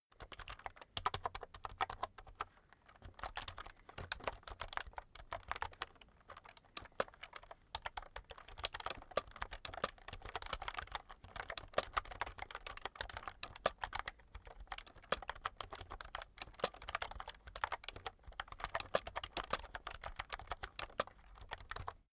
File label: keyboard external type sequence soft; kommunikation; computer